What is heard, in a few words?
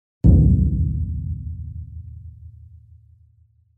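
sound of bass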